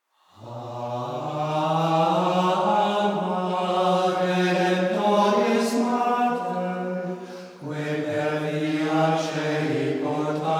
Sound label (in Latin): alma remptoris antiphon, gregorian chant